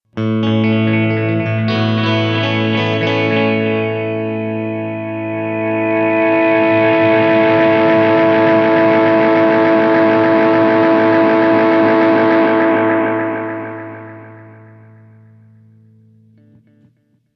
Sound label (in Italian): Feedback; MsDelayette